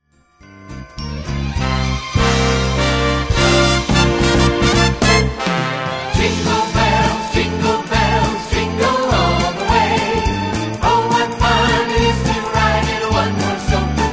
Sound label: Jingle Bells; Seasonal